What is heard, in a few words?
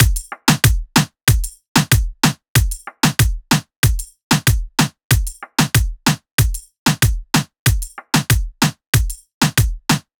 Drumloop 94bpm, Reggaeton